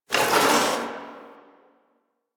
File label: Box Of Trash Rustle